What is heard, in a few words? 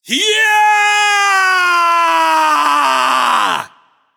battlecry; smite; voicepack; merlin